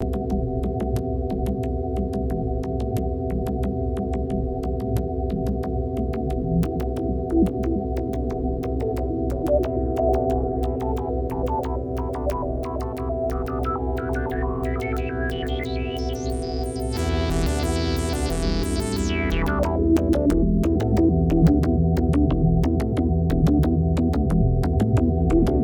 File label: MICROKORG; Squelchy Resobass; Synthstuff Korg MicroKorg